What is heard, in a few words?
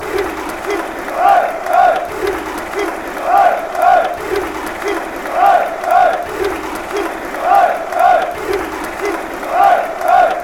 den haag chant